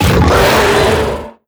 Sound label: react scream, zombie blind